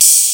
HH OPEN; Big Beat Samples & More; AKAI

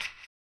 HAMBONE; Blues & Soul

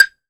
clave, Drums & Perc; AKAI S6000 CD-ROM - Ultimate

